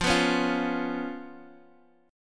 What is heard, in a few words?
B Suspended 2nd Flat 5th, F, chord